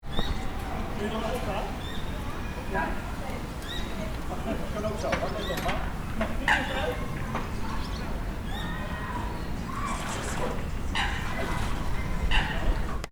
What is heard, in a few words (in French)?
territoires inondables IJBURG cohabitation